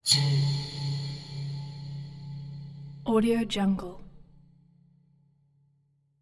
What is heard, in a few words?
tension waterphone tine scrapes impacts approx pitch c3; soundmorph